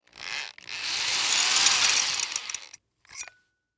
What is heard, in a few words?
Industry Tool Drill Power Drill Drill Bit Remove